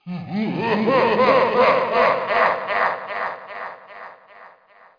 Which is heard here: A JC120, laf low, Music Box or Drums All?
laf low